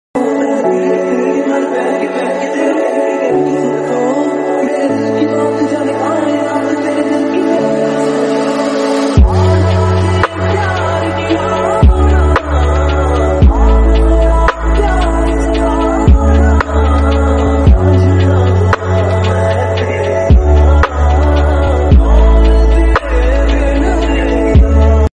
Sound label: LOFI REMIX RINGTONE